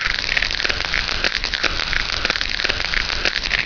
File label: alien clicker, ambience